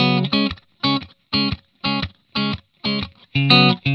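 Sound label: 120, Vintage Blues Guitar rhythm; USB Soundscan vol.22; AKAI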